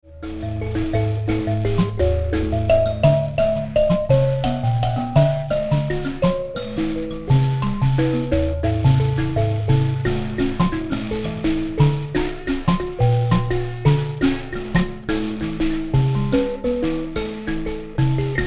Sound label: mbira